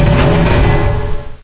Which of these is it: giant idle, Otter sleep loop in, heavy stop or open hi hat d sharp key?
heavy stop